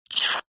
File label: cartoon suck hard; zapsplat